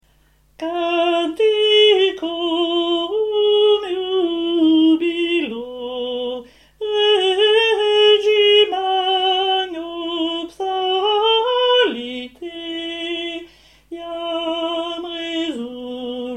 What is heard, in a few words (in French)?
canticorum basses